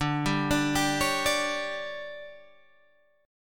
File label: D Major 7th Suspended 4th, Guitar, Open G